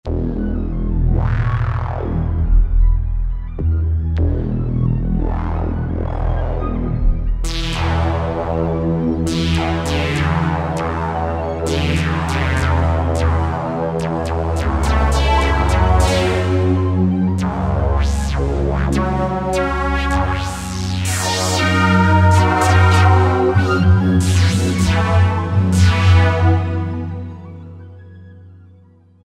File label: Cyber Sweep, Roland JV-2080